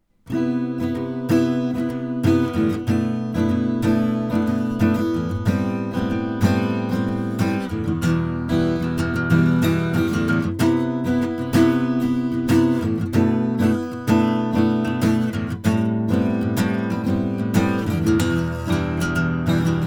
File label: AB Middle plus Spot, Lakewood; Reshapes